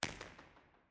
tennis court ortf, falkland palace royal tennis court